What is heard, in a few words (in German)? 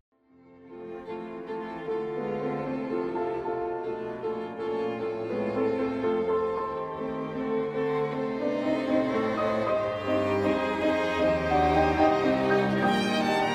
Klavierquintett; 2.Satz; Minguet